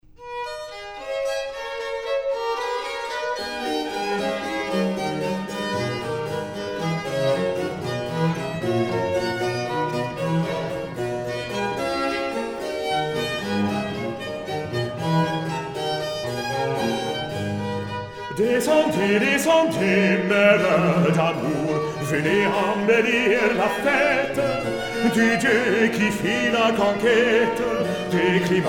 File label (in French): Air; Bacchus